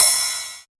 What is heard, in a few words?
subtle reverb crash sound d sharp key, Royality free crash cymbal one shot tuned to the D# note.; drum sample